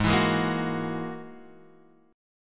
Ab 7th Suspended 2nd Sharp 5th, Guitar, Half Step Down